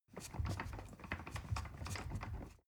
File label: ladder wood rattling long